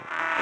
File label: Grid Fragment E 140 BPM, Psytrance; Xenon; Grid Loops